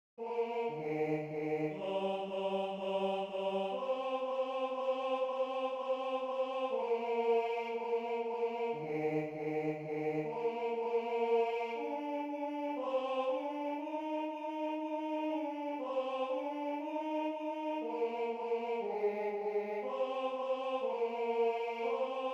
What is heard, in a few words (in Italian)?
Tenore; Base tenore